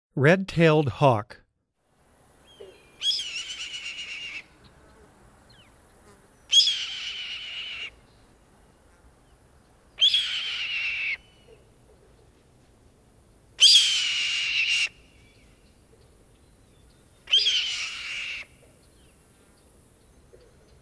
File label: Red-tailed Hawk, Wildlife Sounds